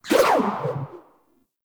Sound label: Rope Winch Rope Launch; Puzzles